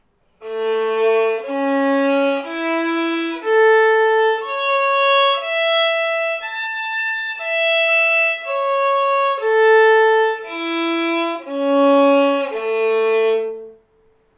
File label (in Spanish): la arpegios; mi primer libro de escalas